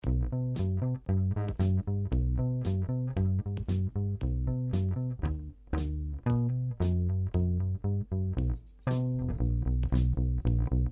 LP Triumph; 1972 Les Paul Triumph; gibson bass

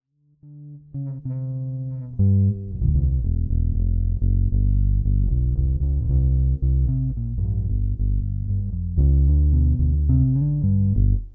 1962 EBD neck, guitars

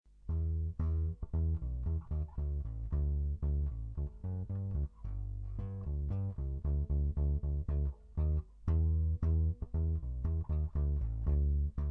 Gibson EB4L, bass

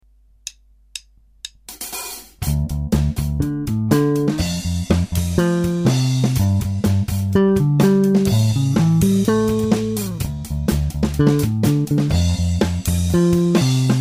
1978 Ripper, gibson bass

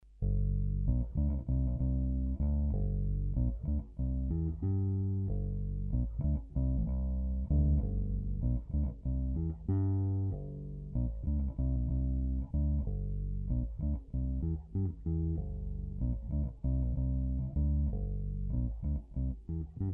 1992 Thunderbird, gibson bass

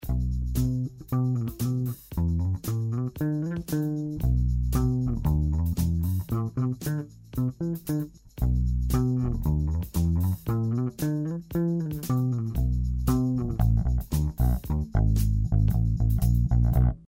LP Triumph; 1972 Les Paul Triumph; gibson bass

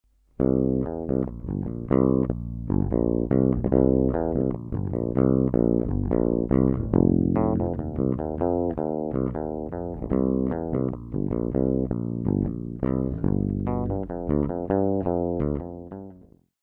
1962 EBD bridge, guitars

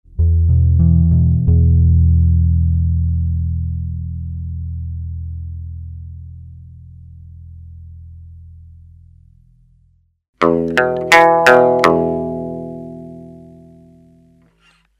RD Artist mellow to bright

